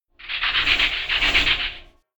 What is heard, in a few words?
Swipe